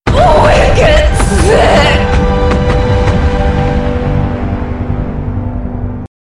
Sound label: music stinger; killstreak music; UT2K4